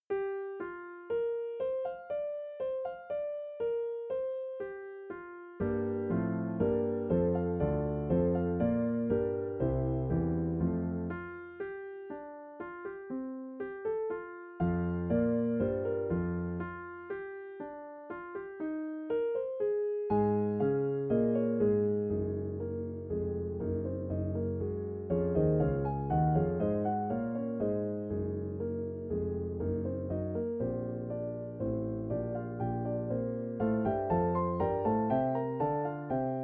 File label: piano; arturia